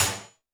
Roland TD-20 Percussion

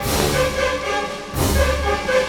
Orchestral Loops

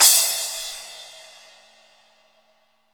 Crash B, Pecussion Hits; Zero-G Jungle Warfare 1, 2 & 3; 90 s Sample CDs

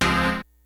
GREASY KIT, DRUMS; Kid Nepro; Elite

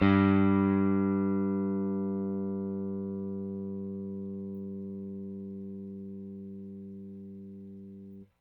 Rickenbacker Bass; Airwindows

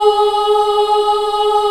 G#3 CHILD OH L, Choir; USB Soundscan vol.28; Acoustic & Synth; AKAI

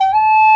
BA WU G 3

